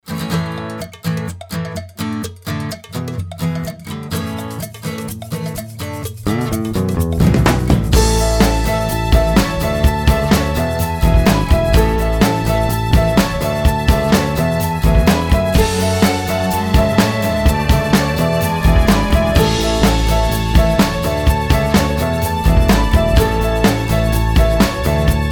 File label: French Advertising Jingle